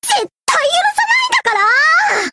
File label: section fail; muni muni! v1.1